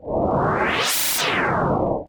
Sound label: whoosh; Whooshes and sweeps